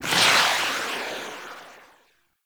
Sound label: Minigun Tail; Warframe; Weapons; Corpus